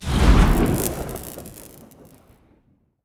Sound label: enchantment shock; weapon enchant